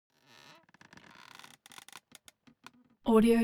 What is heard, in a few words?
object chair office creak sit up; smartsoundfx